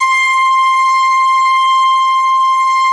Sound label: ST-ORG, STRINGORGAN, Yamaha VP-1